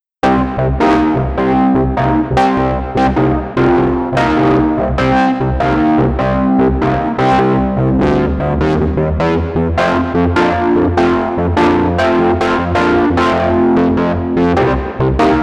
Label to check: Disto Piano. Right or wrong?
Right